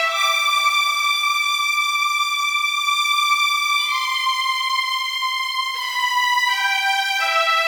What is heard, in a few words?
Stroke, disco